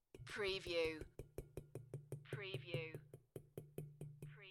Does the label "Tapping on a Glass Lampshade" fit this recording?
Yes